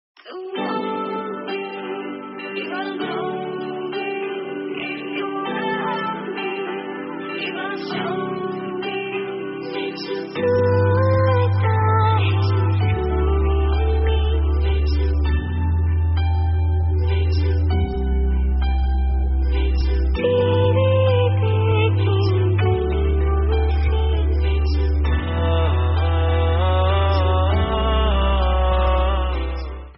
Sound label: hindi love mashup ringtone